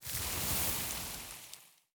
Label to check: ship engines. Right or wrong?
Right